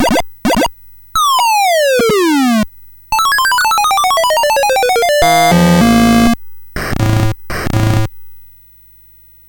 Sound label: Musicbox, sid MOS6581R4